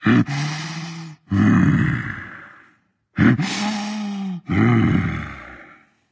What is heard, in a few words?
breath berserk; cuchulainn